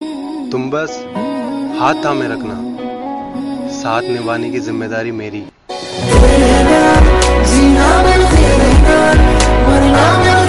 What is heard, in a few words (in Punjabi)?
punjabi